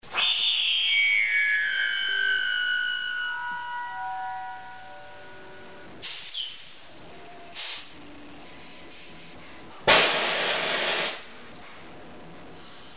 taurus

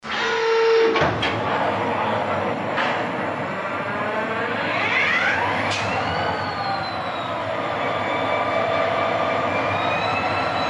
EMD 16 645E3

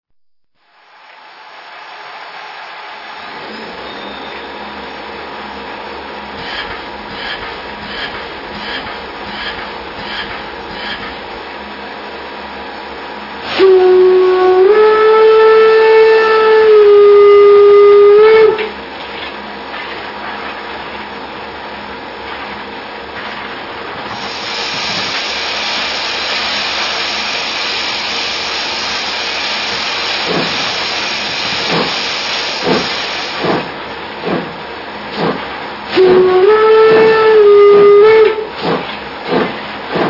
BR 50

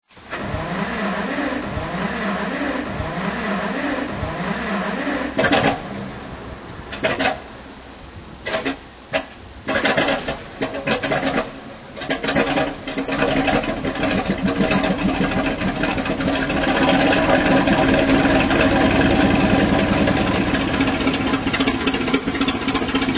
Gasmechanical down; Davenport

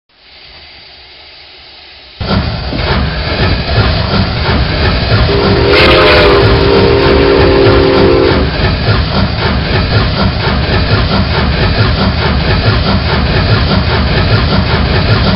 Mallet compound

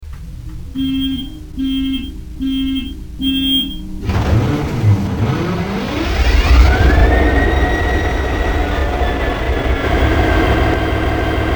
SD70MAC; US

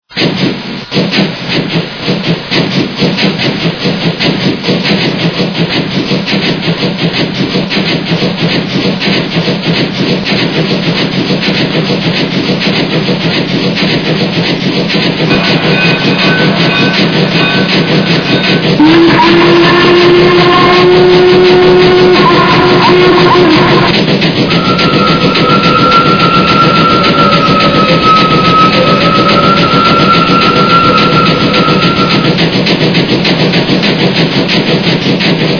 Uintah 2-6-6-2

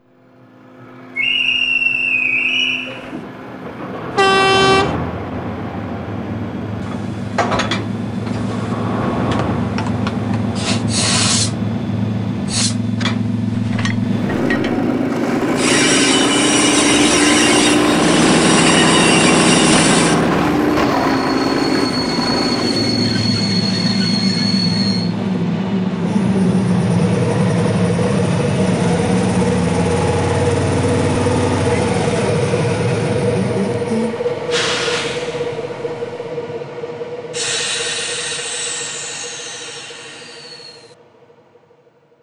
Reihe 2045

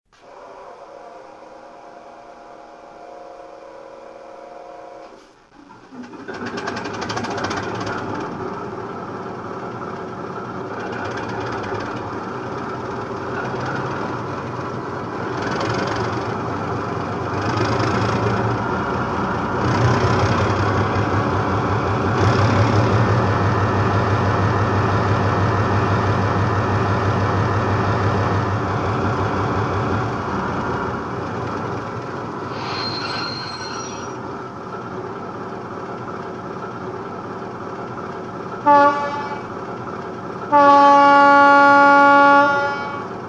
CZSK 751 752

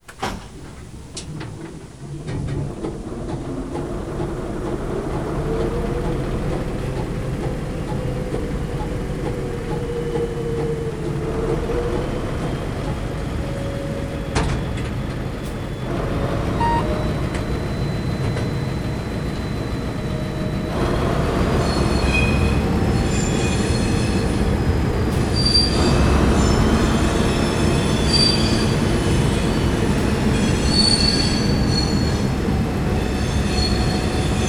BB 66000

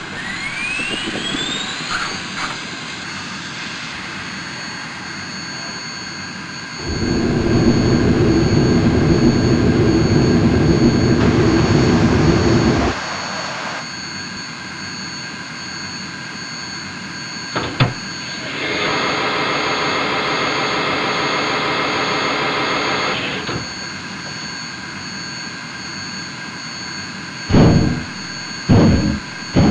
Mallet 2-8-8-2; US

